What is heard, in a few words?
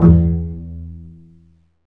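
STRINGS, Cbs Pizz; Roland L-CD702; Cbs FX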